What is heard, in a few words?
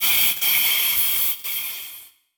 Title Transition Electrical Pulse; Adobe; Production Element